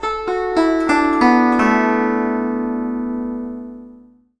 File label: GUZHEN; Spectrasonics; Heart of Asia